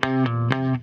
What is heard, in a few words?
HEY, Roots Disco; USB Soundscan vol.32; AKAI